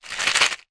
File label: industrial small plastic pot nuts shake; zapsplat